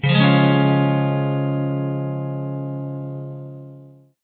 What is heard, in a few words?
C Major barre